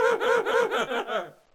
laugh; lolbit

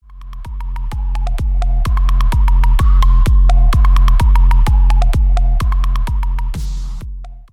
bass boosted

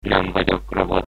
robot

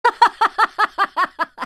laugh; morgana

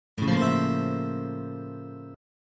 weapon found; detective night

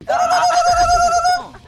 battlecry

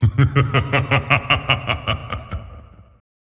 evil laugh

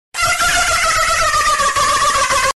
dolphin laugh sound effect; flightreacts

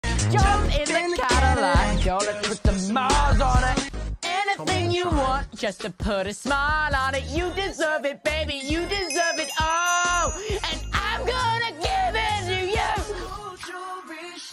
Singing

